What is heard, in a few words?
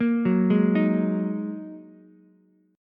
Ab 7th Suspended 2nd down Ukulele; Ab7sus2; arpeggiated; chordshape; JGuitar